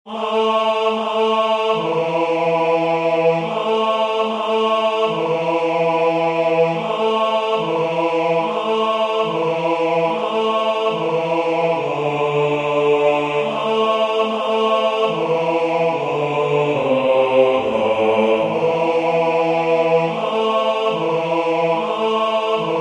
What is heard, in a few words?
bass